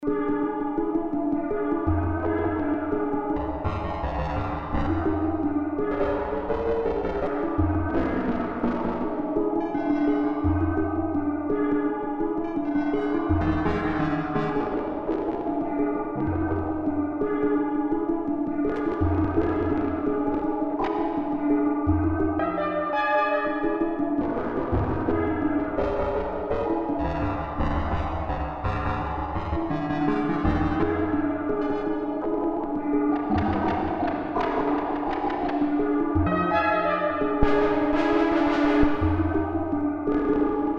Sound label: yamaha sy55